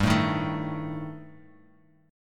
G Suspended 2nd Sharp 5th G, Guitar, Half Step Down